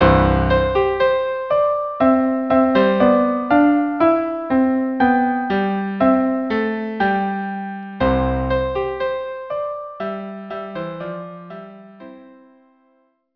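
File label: violin cello duo; mi yemalel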